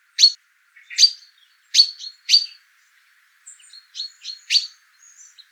call, chaffinch, a perched bird (chink call) - SEX/AGE: unknown - COMMENT: There are many Chaffinches nearby. Background: Blackbird and Chaffinch calls; Fringilla coelebs